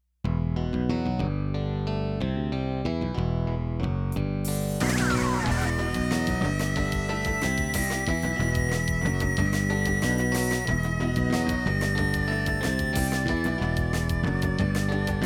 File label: yamaha sy85 preamp